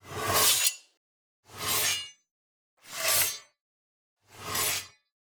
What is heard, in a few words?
broadsword polish